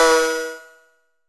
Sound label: laser